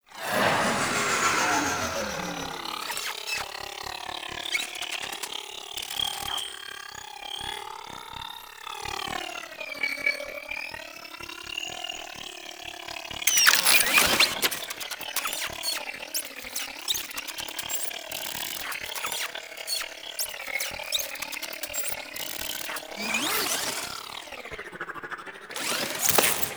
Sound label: Drone; Deimos Intro; Cephalon; Cinematics